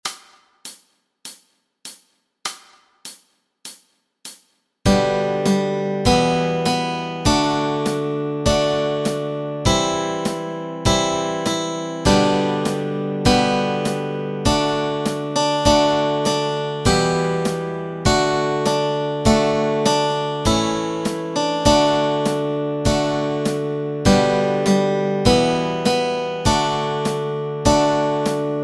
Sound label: Holy Holy Holy melody and chords